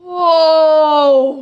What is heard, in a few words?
idle, Truck; Nickelodeon - Destruction Truck Derby; hdanger graffiti